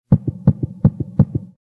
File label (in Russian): sercebienie